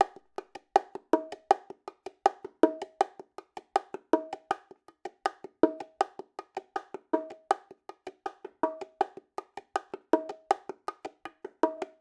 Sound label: latin bongo loop 80; perc construction loops; bongos latin; SEISMIC Drum Sample Pack; Slate